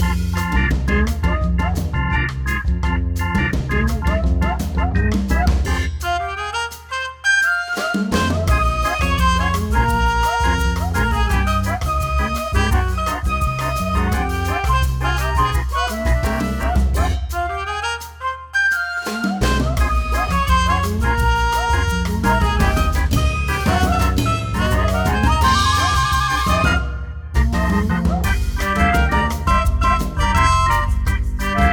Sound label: Bird Bossa; The Jackbox Party Pack 10